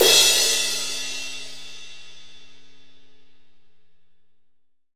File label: crash cymbal drum sound f sharp key